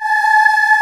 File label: D#5 CHOIR MX; AKAI S6000 CD-ROM - Volume 1; VOCAL ORGAN; ORCH CHOIR